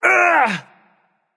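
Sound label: non verbal ouch, voice; cernunnos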